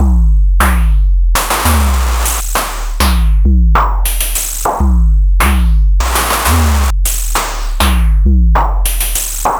Money Groove, Beats; Sonic Foundry (Sony Creative Software); Ma Ja Le Ethereal Textures

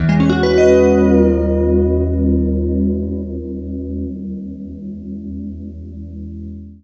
FLESH CHORDS, Guitar

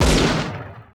GSHOT, LA GUNSHO; Total Control - Action Cutz

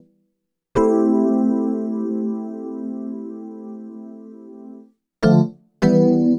Keyboard

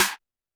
Snare, Roland TD-20

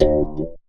bag finger; Univers Sons - Raricussions

